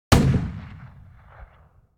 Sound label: gun anti tank; NCH Library